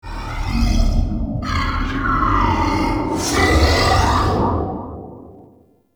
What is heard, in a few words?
Root Cave Root Chatter; Ambience; One Offs; Neathuns Root Cave